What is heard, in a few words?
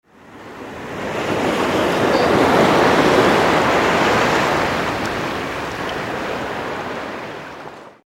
ocean